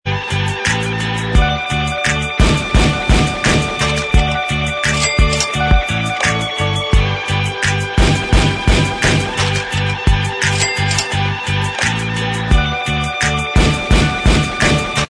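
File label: Instrumental Track, backing tracks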